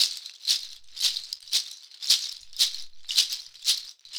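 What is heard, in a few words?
shaker, Synclavier Percussion, World & Orchestral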